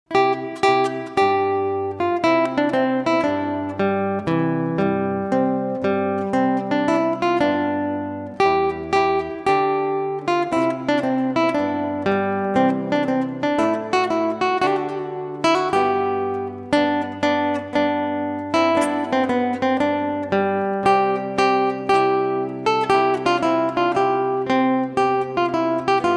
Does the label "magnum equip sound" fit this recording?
No